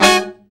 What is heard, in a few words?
BRA SECT; BRA SECTIONS